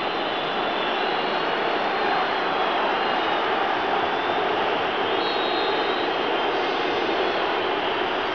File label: crowd, hockey